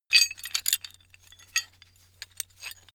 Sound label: metal handling pieces